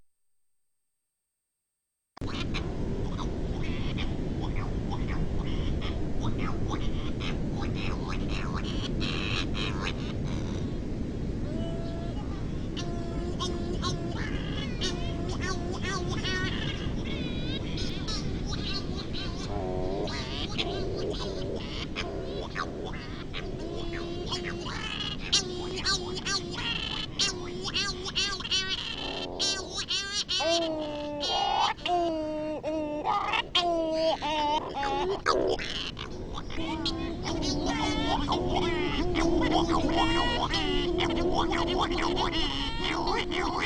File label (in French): cagarro, Acores